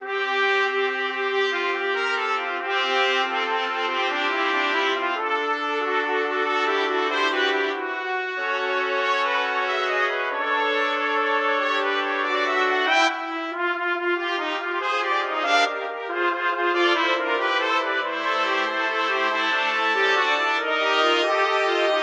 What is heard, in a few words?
Fanfara; Franciszek Rusek